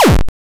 drill burst, miner; minorminer

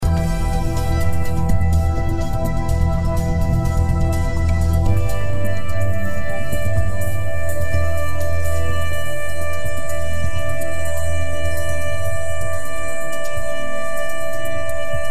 background music

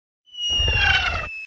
bomb flying; catapult

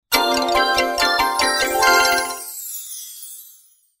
splash screen bg Sound; petparty

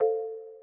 grow

